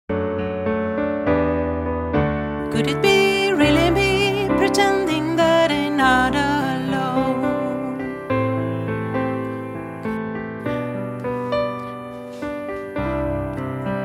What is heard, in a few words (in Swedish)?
sopran